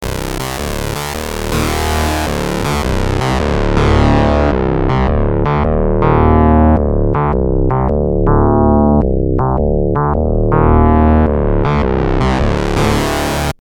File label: fm sync